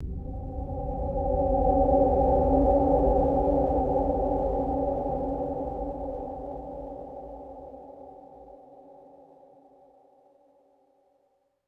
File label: eerie pulsating synthetic low swell; swooshes